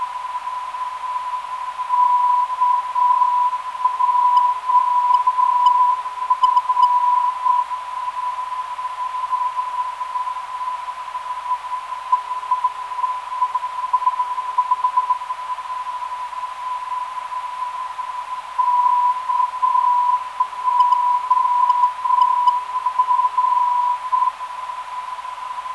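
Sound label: non-directional beacon; KOR; 392kHz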